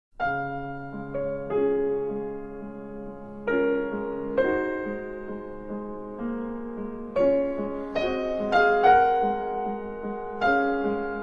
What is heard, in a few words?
background